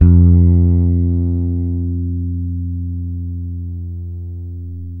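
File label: fretless bass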